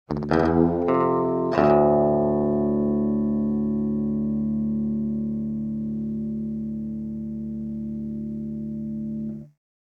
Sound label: Electric Guitar Twang - Texas Melody, (Slide Guitar) - Clean Guitar; Hollywood Edge; sound designer's toolkit v2